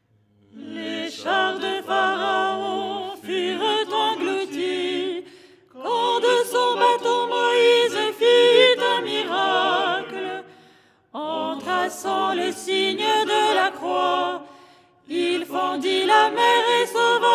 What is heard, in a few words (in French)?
soprano